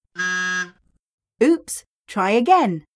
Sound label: wrong